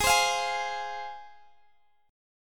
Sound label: Ab 6th, chord